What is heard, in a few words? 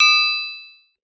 FM Cymbal, harsh; Harsh FM Cymbals